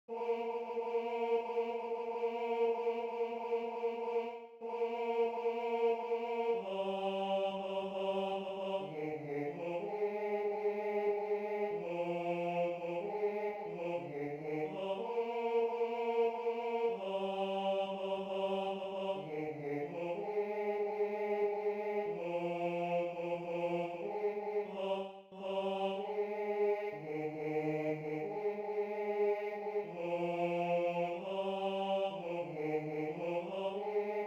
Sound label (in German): Bass I; Leit bleibts da